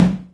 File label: Tom 16 E